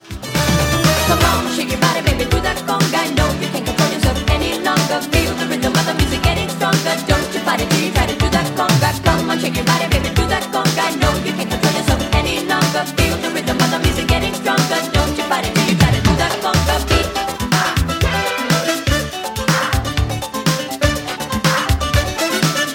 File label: conga; garrysmod